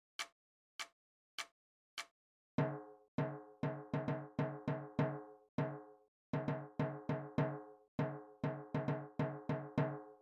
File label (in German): Schlagzeug [100]